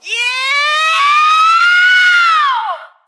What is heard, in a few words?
yeahow, scream; ooh ahh